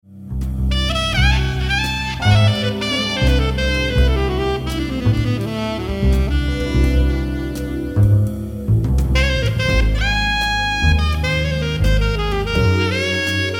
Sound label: Song Alto; Level Air